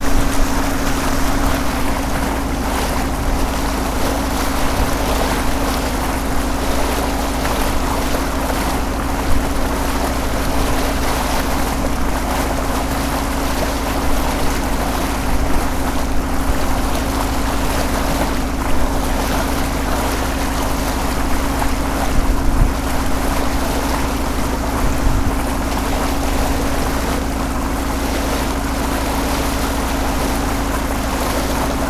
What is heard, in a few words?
transport motorboat on mekong river ben tre with water noise; martinimeniscus